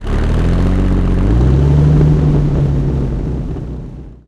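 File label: comet engine interior load, tanks; War Thunder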